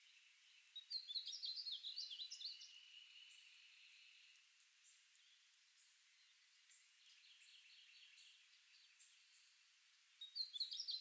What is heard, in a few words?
painted bunting